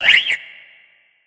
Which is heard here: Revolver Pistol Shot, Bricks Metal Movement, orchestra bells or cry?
cry